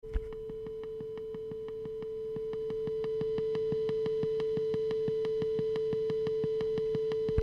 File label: test tone with clicking